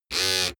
servo short

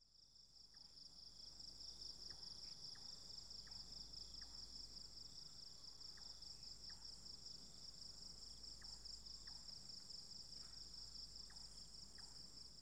cicadas during daylight

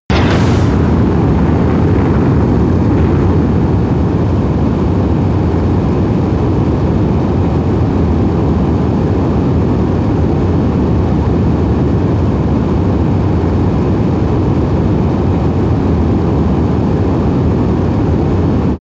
spaceshuttle fly whoosh

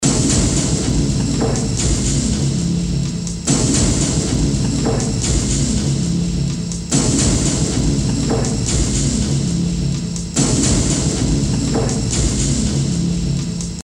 musical fx loop

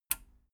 metal button radio release power off

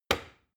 plastic button start push heavy

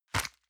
envelope grab hard

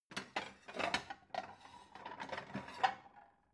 plate multiple take soft

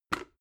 plastic bowl grab

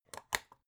folder lever arch close

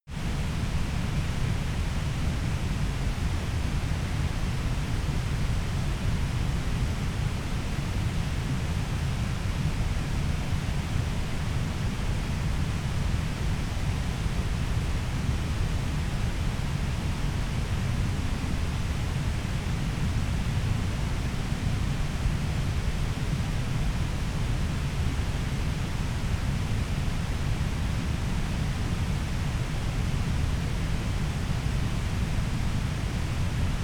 stream medium distant averted

